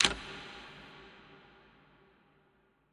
Clock Stop; MiSide

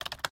Key Spray; Game rip; MiSide

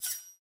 Knife; MiSide

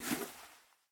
Smartphone Take; Game rip; MiSide

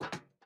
Step Iron; Game rip; MiSide

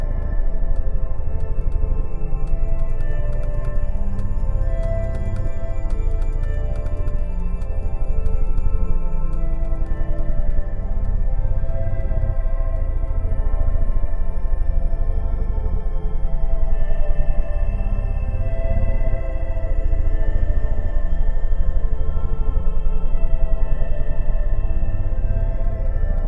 Ambient Pause Player; Game rip; MiSide

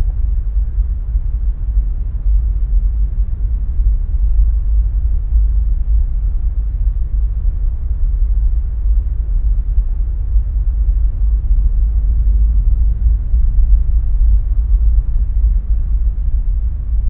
Ambient House Sub; Game rip; MiSide

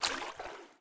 Step Deep Water; Game rip; MiSide

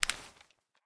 Flash Light Remove; MiSide

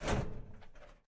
Server Batary Open; MiSide

